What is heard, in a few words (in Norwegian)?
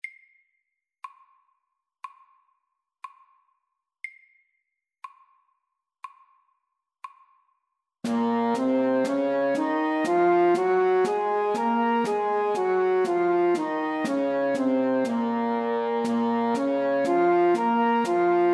B moll natura SAKTE